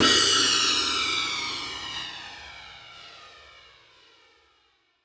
cymbal single crash

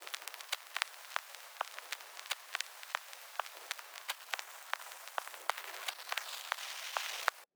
Midnight Dancefloor Kit 125bpm Vinyl

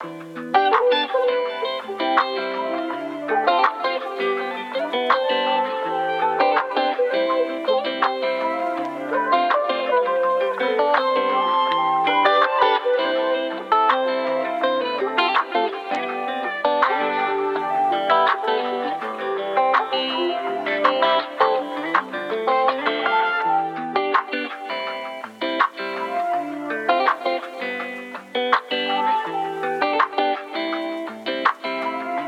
songstarter loop beautiful Cmaj

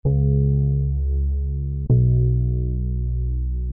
Warm Bass Pad Loop

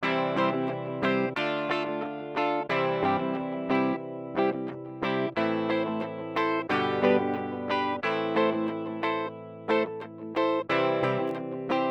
Mute Guitar Em 90 Dry

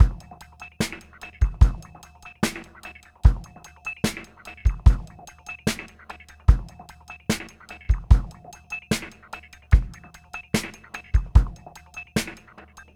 148 kit funky bell

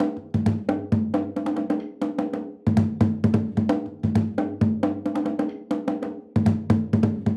130 DunDun loop Drum Percussion rhythm; The Gambia sessions

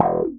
bass slap E

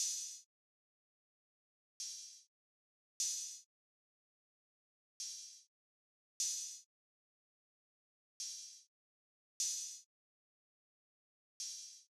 Open Hat Cm 150bpm; SAVAGE MOOD vol 2

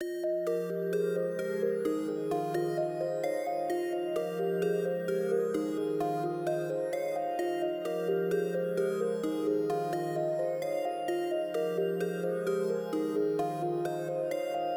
Trance Trap Loop 130BPM F

